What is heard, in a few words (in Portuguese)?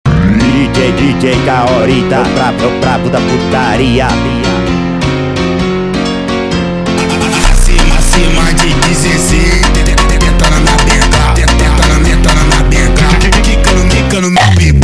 funk do piano triste; Phonk